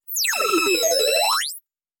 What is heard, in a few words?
Scanner Beam; sound effects bible